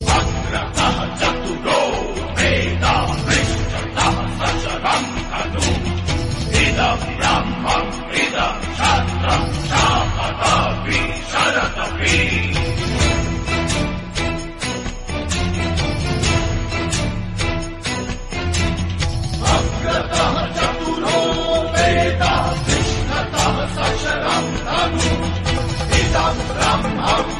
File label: Theme Bhakti Ringtone; Mahabharat; Parshuram